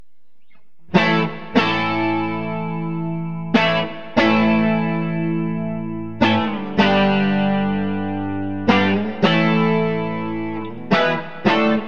evolution bridge clean, burny fugly clips

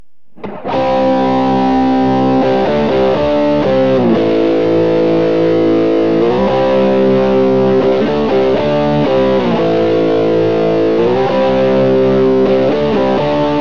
neck crunch; burny; fugly clips

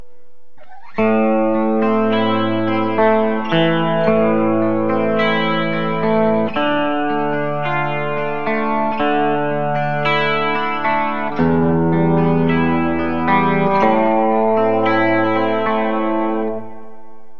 pg bridge clean specpick; burny; fugly clips

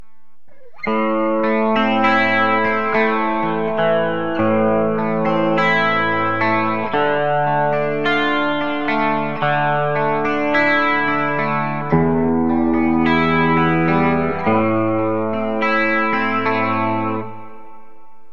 brobucker bridge clean specpick; burny; fugly clips

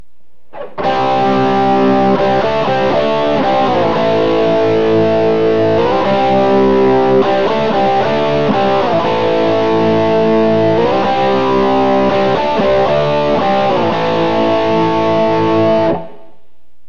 bridge crunch; burny; fugly clips

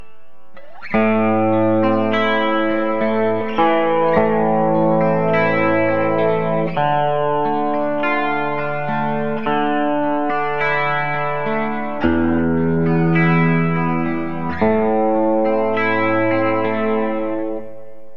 marshallhead bridge clean specpick; burny; fugly clips

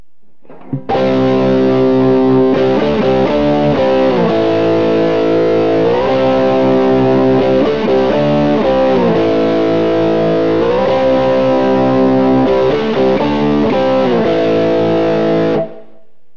neck crunch; imperial; burny; fugly clips

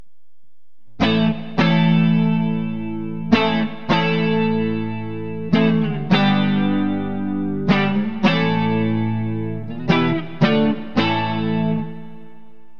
classic57 neck clean, burny fugly clips

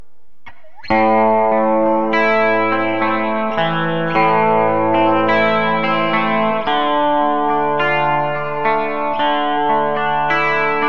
fralin bridge clean specpick; burny; fugly clips

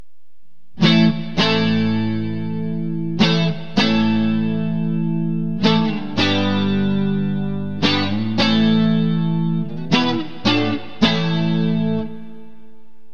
dragonsplit neck clean, burny fugly clips

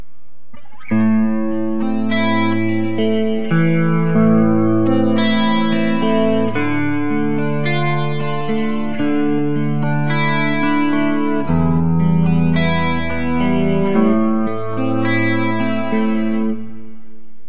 neck clean; ant; burny; fugly clips

